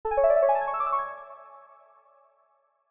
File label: tone; Valentines; seasonal